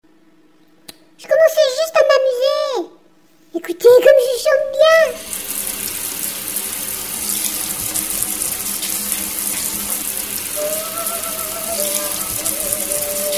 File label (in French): douche